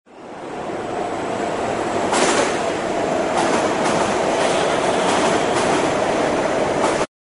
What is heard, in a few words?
train subway arrive in station; transport